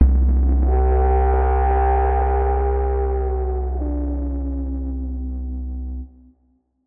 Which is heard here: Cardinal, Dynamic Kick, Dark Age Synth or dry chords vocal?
Dark Age Synth